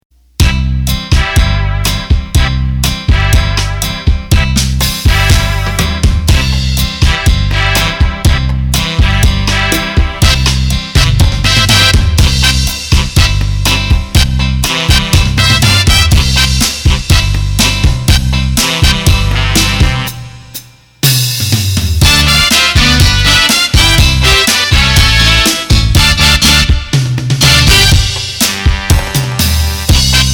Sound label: Cha-Cha; roland bk7m